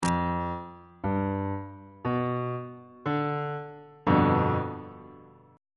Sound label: g dominant 7th 3rd inversion on bass clef